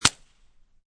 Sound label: Suppressed Pistol Amphibian Bridge; Pistols; VRSonic; Guns